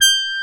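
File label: horn G5, synthesizer analog horn; AKAI S6000 CD-ROM - Volume 1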